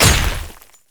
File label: basicattack human; LoL; shyvana